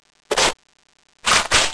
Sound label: awp clip in